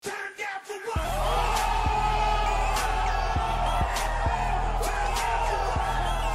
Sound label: oooooh